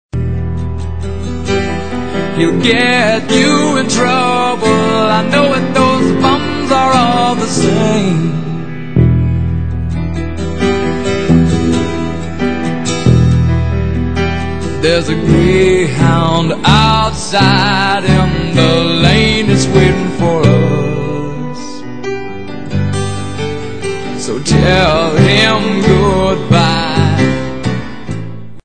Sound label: blues